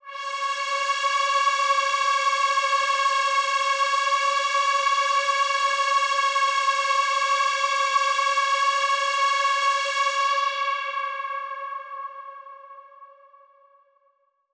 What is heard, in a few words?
Big Pad C#4; KG MegaSaur